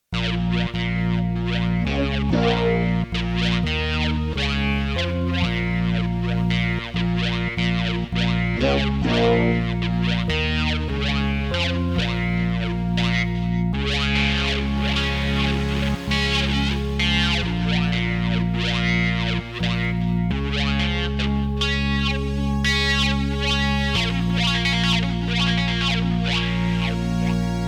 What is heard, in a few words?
keys